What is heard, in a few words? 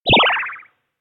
heartbeat; anemoi